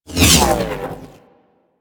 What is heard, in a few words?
Crp Space Figter Laser Agent Fire Whiz By B; Space Fighter Laser Agent